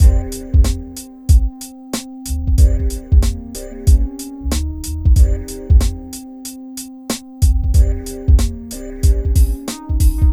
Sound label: MIX, Hip Hop and R&B; Best Service ProSamples vol.02; AKAI